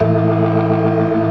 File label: ORGAN ALL STOPS; Keith Emerson Signature Series